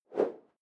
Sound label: whoosh simple short airy